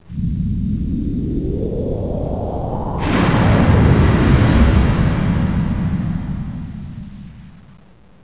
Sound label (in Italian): suonerie musica rock